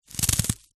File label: food ice pop frozen bend squeeze; zapsplat